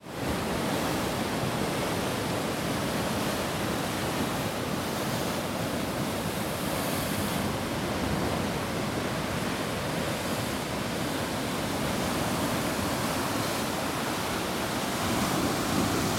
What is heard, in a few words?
Waves on rocks San Marco